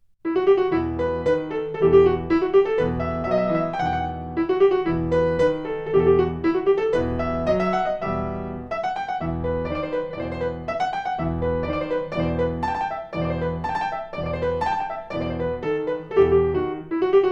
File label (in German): Tambourin